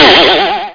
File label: arrow